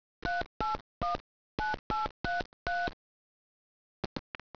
DIALFONE; 10,000 Graphics Pack Volume 1; Media Graphics International

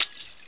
GLASS; Media PAK Basic Software Bundle MBP-01

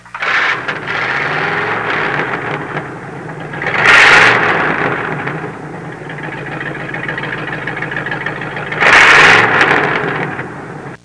HOT ROD, TRANSPO; Easy Personal Web Page

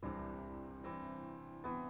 PIANO

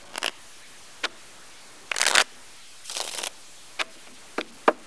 shuffle

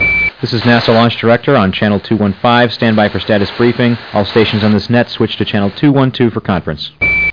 LAUNCH

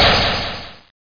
echo shot

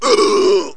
PAIN; REDNECK; RAMPAGER

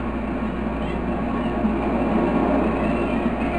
seagulls2; Fishing Flash Cards

